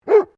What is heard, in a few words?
Animal Dog Labrador Bark; Various domestic dogs; Dogs (Sound Effects:Animals)